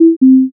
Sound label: error